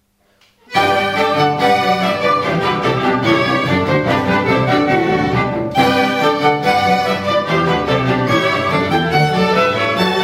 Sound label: String Ensemble; Trasimeno String Ensemble; Concerto in Sol M."alla rustica" (Allegro)- A.Vivaldi